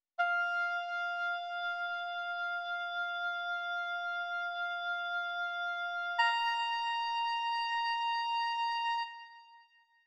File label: Oboe solo